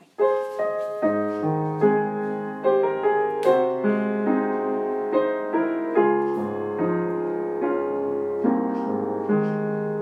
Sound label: Amazing grace alto; 歌